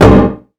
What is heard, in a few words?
drywall impact, Bullet Impact; Dystopia; physics